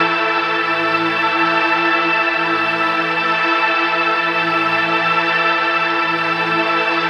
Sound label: Pad Loop E 135, Techno; Ghosthack; AC2024 - Day 1 - Techno Sounds 2025; Pads